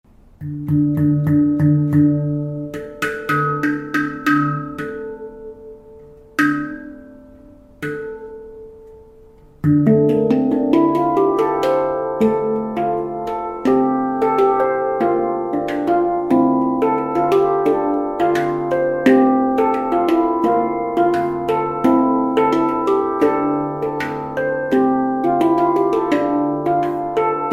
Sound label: handpan 10 notes; steel blue